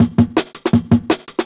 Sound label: Jungle Breaks